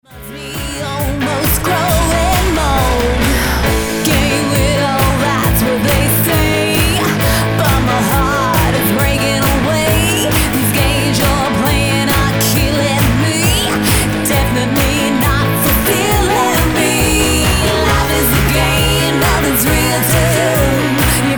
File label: Game Over